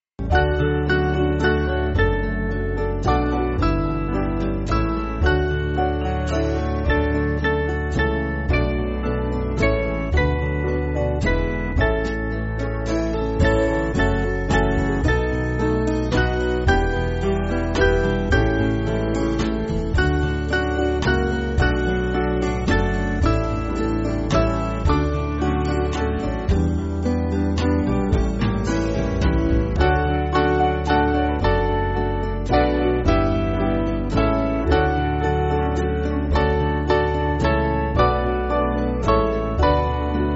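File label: Band, Music Backing Files for Churches; Jesus Thy Blood; St Crispen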